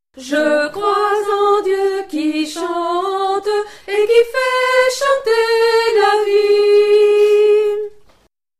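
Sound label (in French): Je crois en Dieu qui chante Soprano, chœur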